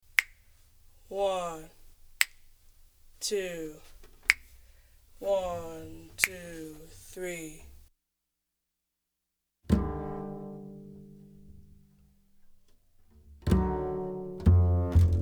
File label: minus melody basses only